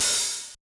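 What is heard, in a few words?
hi-hat Open Distorted, Yamaha DJX 2B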